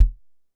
Drum X Kick, Pearl Drum-X

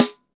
SD, KIT Real-Kit; 90 s Sample CDs; Sound & Vision - Gigapack I CD 1 (Roland); Real-Kit m 4

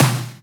Snares; Vengeance - Essential Clubsounds Vol.4

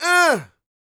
A YELL, MALE HUMAN; ILIO; Vocal Planet

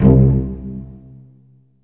CL PZ, strings pizz; ILIO; Kirk Hunter; Virtuoso Strings

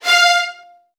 F2, VN 16TH, Virtuoso Strings; ILIO; Kirk Hunter

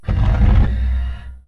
sfx sci fi; Masterbits - Switch FX

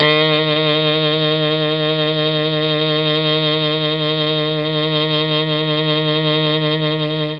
D#2 MKII SAX, Mellotron; Mike Pinder - Mellotron Archive CD

